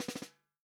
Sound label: snare drum, FUNK SNARE CHROMATIC; REAL DRUMS